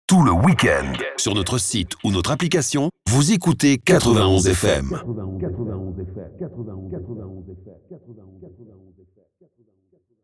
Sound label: Drop Jingle